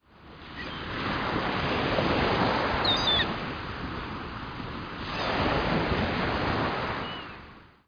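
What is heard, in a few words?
surf ambience; Real War Air Land Sea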